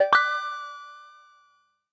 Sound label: Bubble, notifications; Retro Riffs